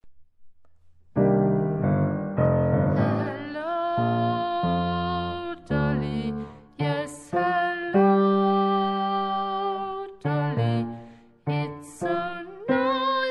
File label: Bass